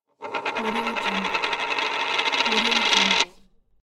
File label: rotating coin on wooden surface